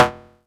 percussion; FutureRetro FR777

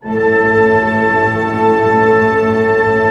TREM A PP, orch trem; Sound On Sound - Peter Siedleczek's Orchestra

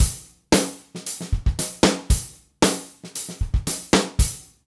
DRUMS; East West; Funky Ass Loops

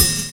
CHIMES; Univers Sons - Kitch 70s

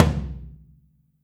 FLTM OH R, Kit OH Mic, Drums Percussion

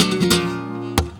GUIT BREAKS; Best Service; World Colours

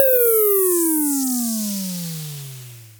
FX, Dual Percussion Synthesiser; Klone Dual-Percussion-Synthesiser

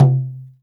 GENDANG C3, DRUM; Spectrasonics; Heart of Asia

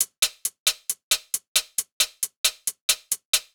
135 Hats, Drum Loops; Zero-G; Soundclash Nu Dance Electro; Acidized Wavs; 135bpm; Boom Selector

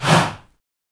"HA", Heart of Africa, shout; Spectrasonics; Heart of Africa 1; CH. SHOUT1

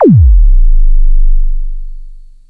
thump; Best Service Alpha Dance vol.2; ZAPS+THUMPS

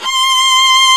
ARCO VIOLA, Solo String; E-MU Producer Series Vol. 2; More Studio Essentials; Composer; Solo String Combo

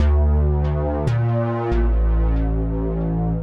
Bass Synth Riff D-Bb-A, Grime, 140bpm; Zero-G Sound Sense Grime Scene; GS Construction Kits; Just Flex

